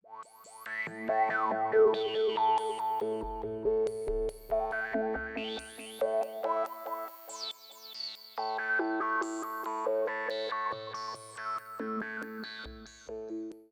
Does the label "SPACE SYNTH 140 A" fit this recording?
Yes